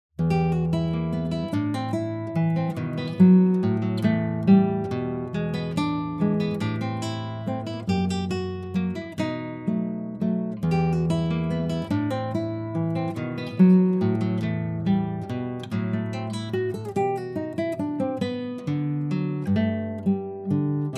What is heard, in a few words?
Mazurka; N Emanov